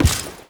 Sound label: Step Default, Footsteps